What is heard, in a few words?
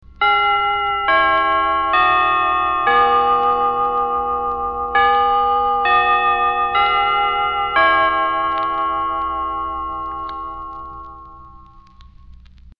chimes; percussion; mallets